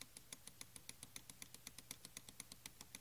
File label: Logitech trackman wheel; nahrávka klikání na trackballu Logitech TrackMan Wheel; mělo by odpovídat klikání na běžné, spíše hlasitější myši